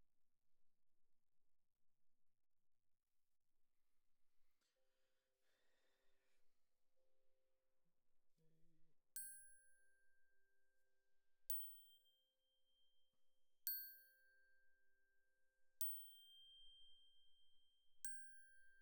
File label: Glock Close